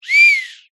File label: whistle blow light